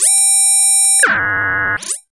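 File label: synth fall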